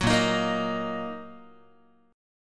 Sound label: Bb Suspended 4th Sharp 5th Bb down Guitar; Bbsus4#5 strummed; 9,6,6,8,7,x